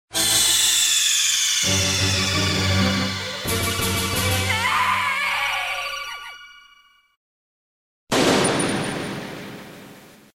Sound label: game over; cave